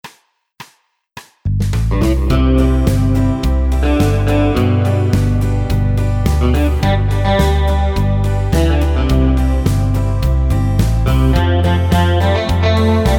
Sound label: Country